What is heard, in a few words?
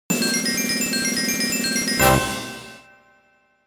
Mini-Game Victory; Mario Party; N64